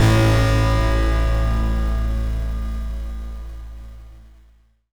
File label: bell hit